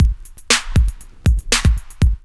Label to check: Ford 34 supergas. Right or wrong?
Wrong